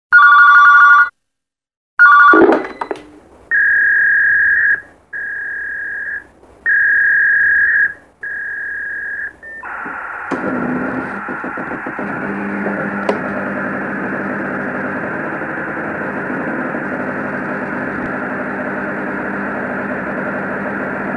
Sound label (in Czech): telefon fax